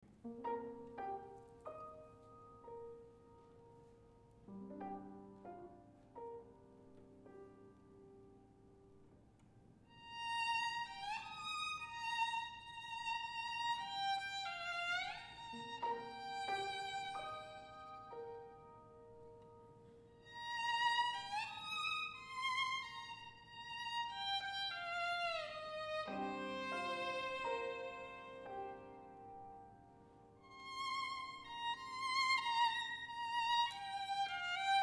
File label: Violin & Pi; Hayashi; 6 Pieces for Violin & Pi